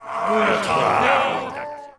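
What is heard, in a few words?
murmur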